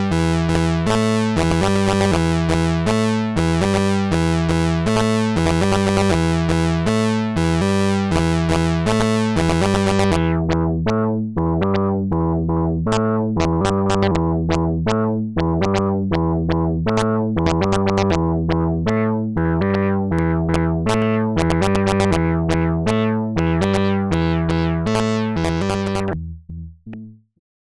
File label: Tonestar 2600; Studio Electronics